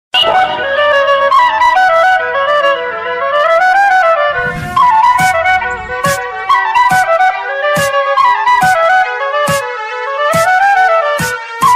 psy ringtone psy trance ringtone psy bgm trance bgm